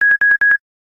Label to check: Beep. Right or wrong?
Right